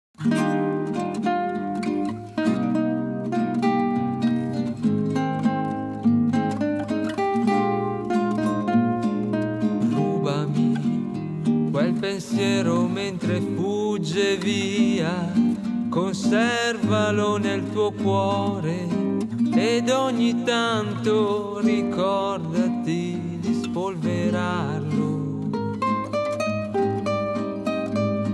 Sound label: acoustic version